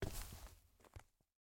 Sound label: Ladder Up; MiSide